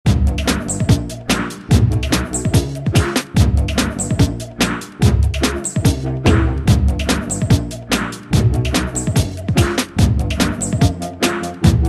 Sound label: Music; Spike Music 1